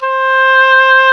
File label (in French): HAUTBOIS; East West; Global Sounds